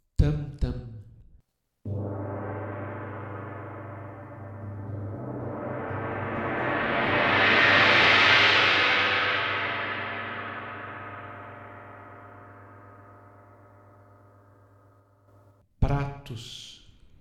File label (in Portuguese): instrumentos metal